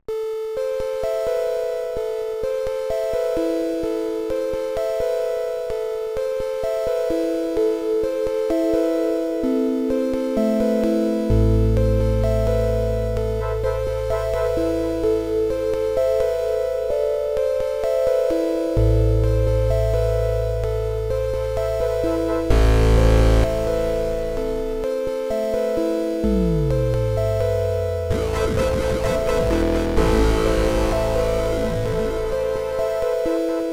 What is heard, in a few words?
Sinus